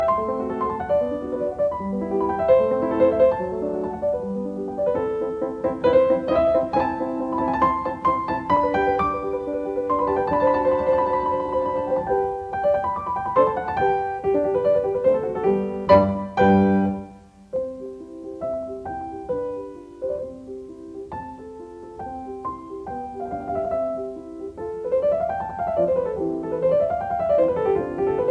Piano Sonata No 16 in C major K 545 Sonata facile I Allegro; Lili Kraus